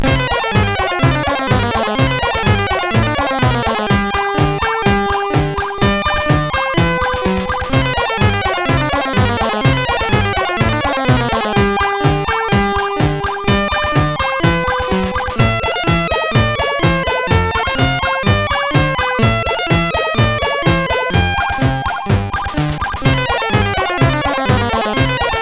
sid CSG8580R5; Fiendish Freddys Big Top O Fun

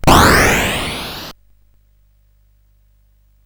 sid CSG8580R5; Gremlins 2-The New Batch

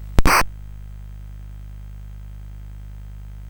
sid CSG 8580R5

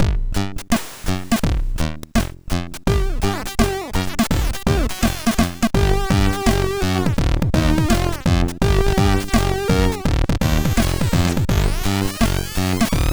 Bad Eggs game over, MOS6581; sid

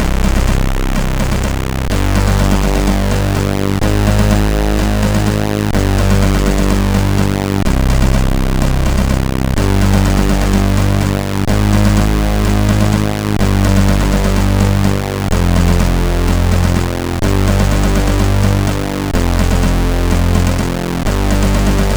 Dark Gray, MOS6581R3; SID

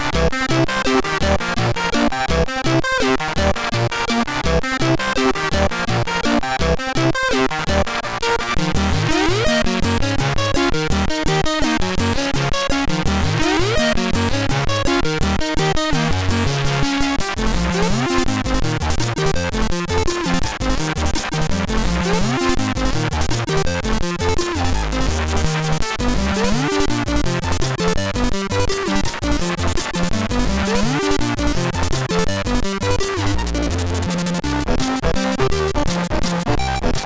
Space Adventurer Danger, MOS6581R3; sid

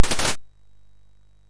sid MOS6581R3; Gremlins 2-The New Batch

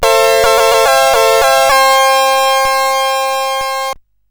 sid CSG8580R5; Fiendish Freddys Big Top O Fun

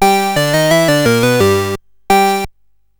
sid CSG 8580R5; GeeBee Air Rally

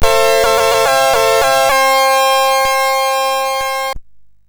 sid MOS6581R2; Fiendish Freddys Big Top O Fun

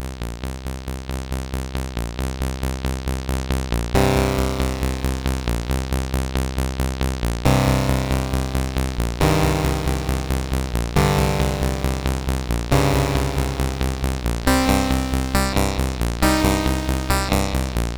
sid CSG 8580R5; Aliens

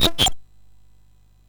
sid MOS 6581R2

